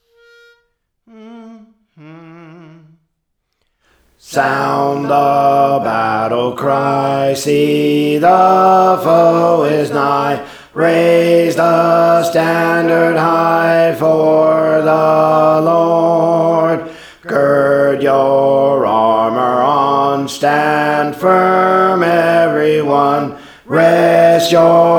Alto; Praise For The Lord Training

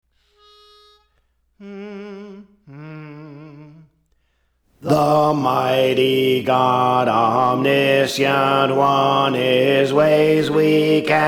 Alto; The Providence of God; Praise For The Lord Training

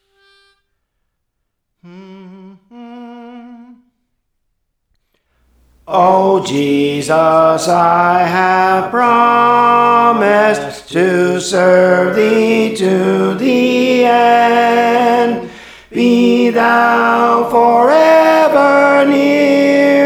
Tenor, Songs; Praise For The Lord Training

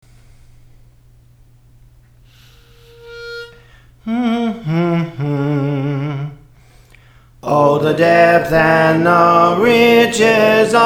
Soprano, Songs; Praise For The Lord Training

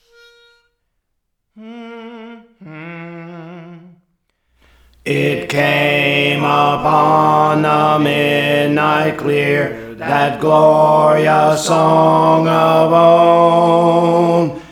Alto; Praise For The Lord Training

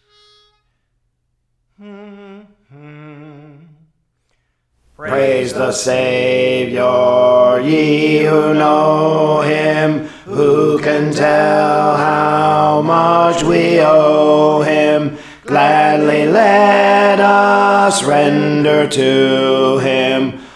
Alto, Praise For The Lord Training

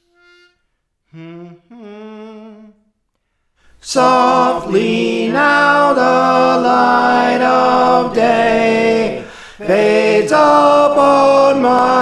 Tenor; Praise For The Lord Training

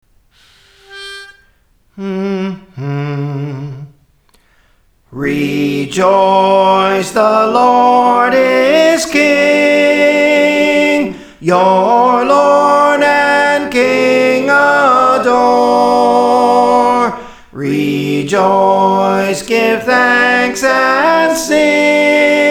Soprano, Praise For The Lord Training

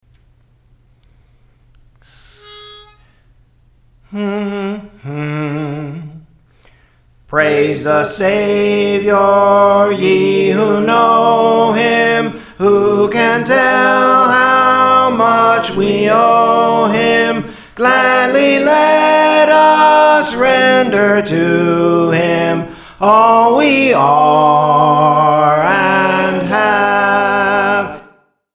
Soprano, Praise For The Lord Training

